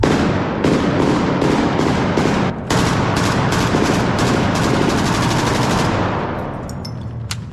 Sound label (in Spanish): tiroteo de barrio